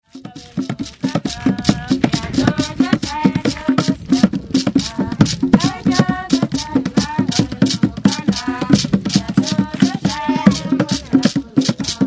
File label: african-rhythm